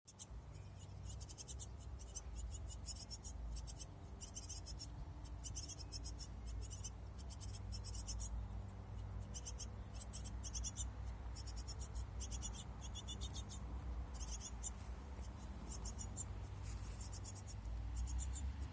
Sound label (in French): mesanges